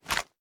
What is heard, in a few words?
drop quick down, reloads; gmod